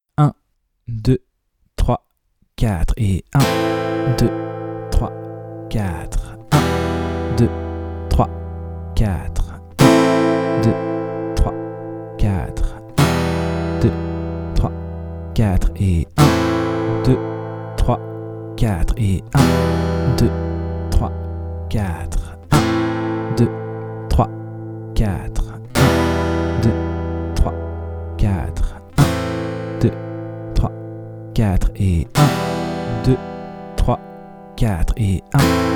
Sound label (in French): moyen Enchainement de Am à Em